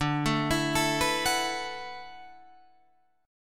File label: D 6th Add 9th D, Guitar, Drop D